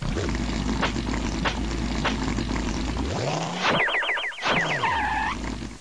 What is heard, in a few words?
ship; KidPak